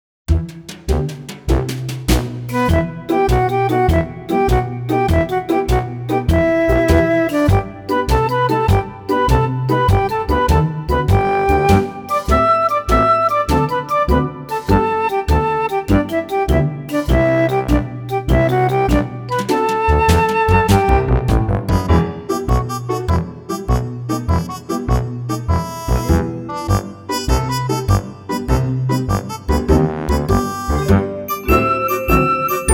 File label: Picked up Galops Continuous B